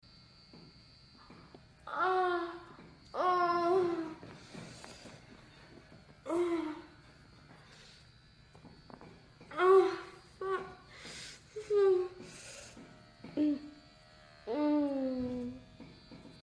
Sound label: moan, moans; The Witcher Hunt